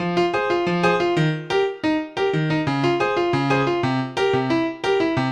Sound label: PIANO; maudio; Latin Element